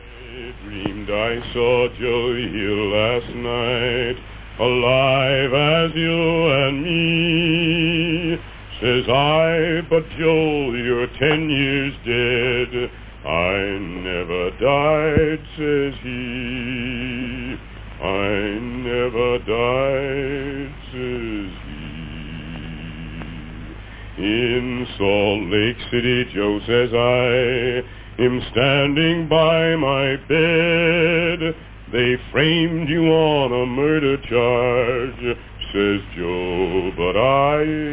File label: sung to Scottish miners; 1949